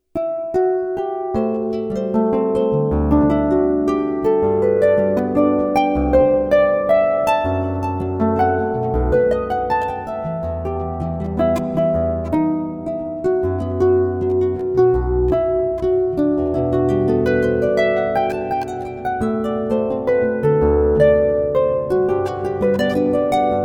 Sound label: Jazz Standard